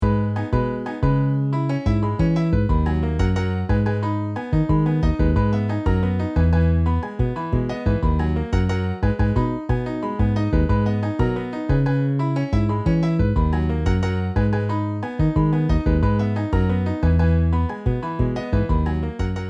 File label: Piano Bass MIDI